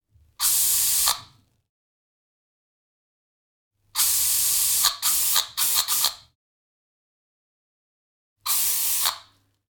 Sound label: Hair Spray